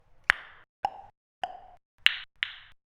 Popping Sound

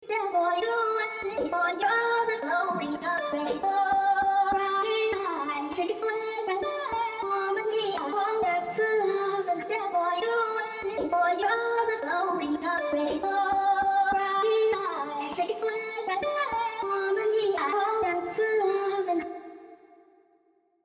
Child Vocal Chops

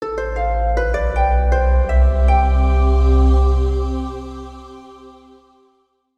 Shine Magic Stinger

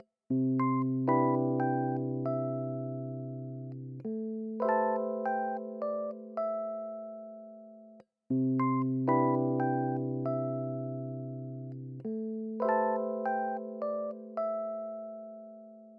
E Piano Loop

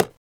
Pen

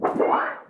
Sheet Metal

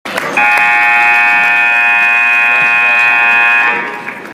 Electronic Scoreboard Horn

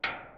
Metal Ping

